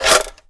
magazine out; Valorant; operator

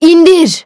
release wolf; Valorant; cstrike; agent; fade

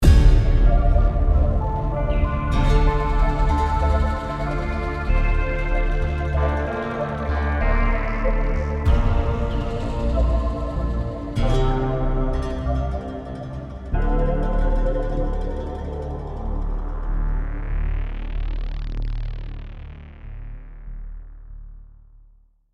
map start background; Valorant; cstrike

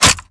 Reload Mag In; Valorant; ares

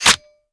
Reload Mag In; Valorant; stinger

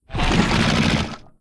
inspect; cstrike; valorant; rgx 11z pro vandal

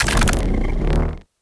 inspect; cstrike; valorant; araxys operator

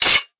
knife draw; Valorant

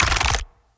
equip, weapons; cstrike; valorant; araxys operator

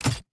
equip, weapons; cstrike; valorant; singurality phantom

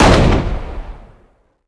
weapons; Valorant; cstrike; judge

